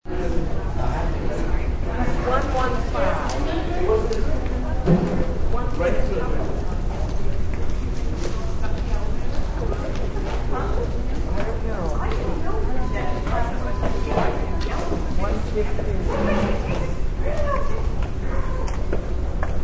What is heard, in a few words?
Caterers Setting up, Catering company setting up for banquet.; Banquets and Receptions